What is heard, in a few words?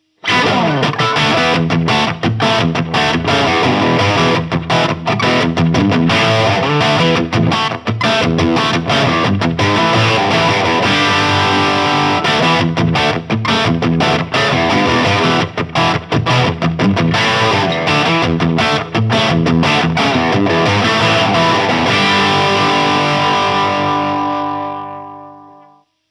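Crunch Humbucker, guitar amp; Marshall 1987X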